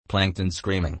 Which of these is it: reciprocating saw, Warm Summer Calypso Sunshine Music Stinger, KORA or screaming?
screaming